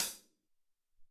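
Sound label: Hat Closed, Alesis SR-18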